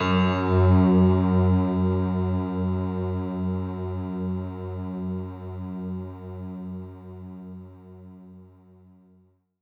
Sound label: F#2, synth; 90 s Sample CDs; NiceBeats; SynthLine VOL-23